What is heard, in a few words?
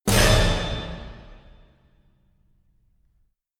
splat